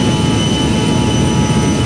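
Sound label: swarm engine; forsaken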